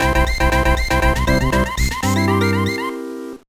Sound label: Jingle; inukko club fukumaru no daibouken; gba